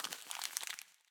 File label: Crumpled Paper